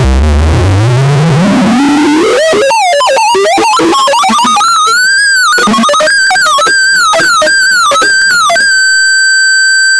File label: bear noises squeaky toy